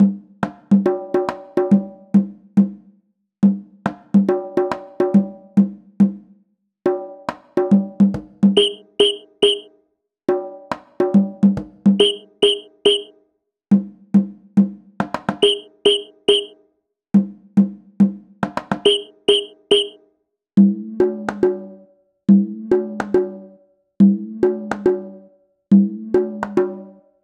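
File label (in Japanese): background music リズムのみ; heroland ps4 switch windows gamerip 2018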